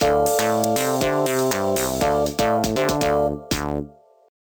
Transition

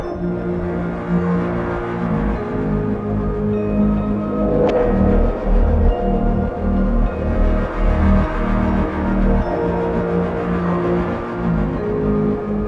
BGM dungeon ship; starbites

